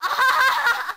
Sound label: win; king